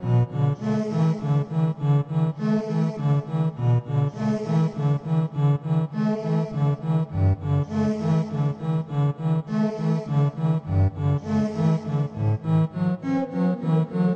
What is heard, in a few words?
Small piano room music